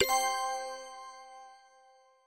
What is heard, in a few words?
treasure found; Pirates; treasure quest